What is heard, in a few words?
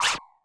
Mega Drums, Korg 01W